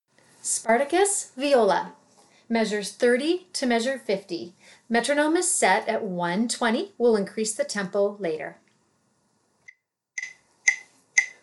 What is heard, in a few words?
viola; spartacus